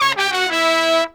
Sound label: 120BPM, Horns